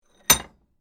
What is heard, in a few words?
Tool Metal Vise, Industry, Tool, Metal, Vise, Hand, Impact; BlastWave